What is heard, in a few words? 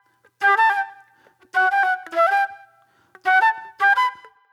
Sparse Flute